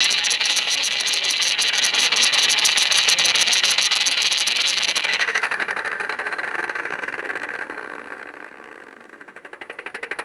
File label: roulette ball spinning and landing